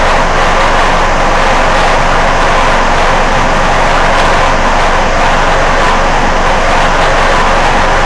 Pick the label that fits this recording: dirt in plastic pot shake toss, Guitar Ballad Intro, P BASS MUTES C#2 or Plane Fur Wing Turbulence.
Plane Fur Wing Turbulence